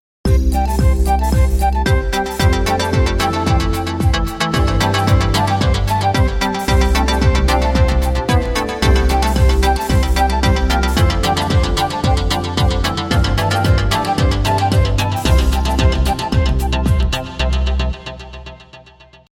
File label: Birdybeat